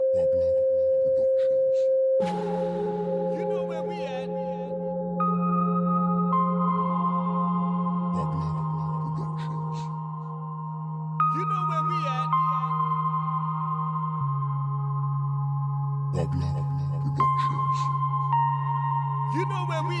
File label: Reggae Dancehall